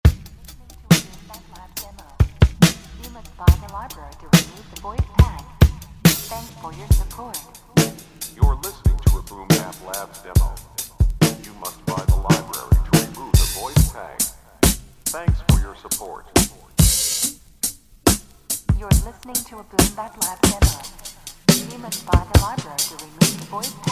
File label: drums; Memory Laws Drums Vol 2; boom bap labs